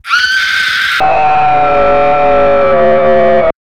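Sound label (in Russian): искаженный женский крик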